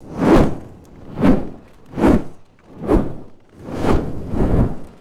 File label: fast and slow fire torch whooshes